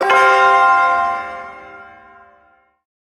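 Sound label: Shawzin Pentatonic Minor Chord; Pent Min Chord G; Warframe; Duviri Ersatz Shawzin; Chords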